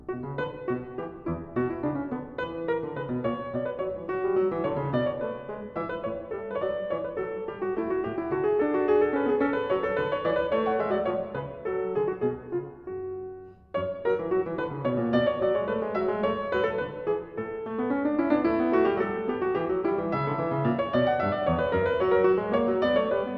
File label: B Minor, Bourree; Bach; French Overture In B Minor; BWV 831; Bourree I Da Capo; Complete Solo Clavier Works